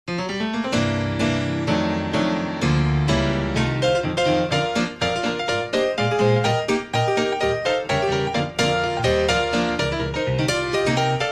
I've Got That Old Time Religion, Old Time Gospel Piano